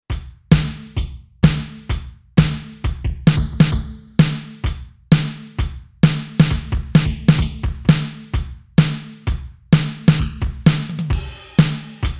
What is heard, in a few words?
Drum Kit